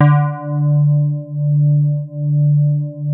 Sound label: HARD D2, SYNTH PLUCK; Music Store - Claudius Diemeter Schwerlos